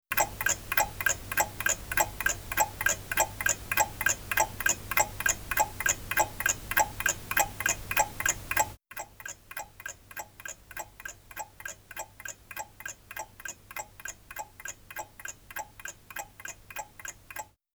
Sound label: shure ksm141 noise; Test mikrofon